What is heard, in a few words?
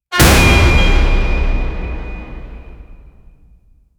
brass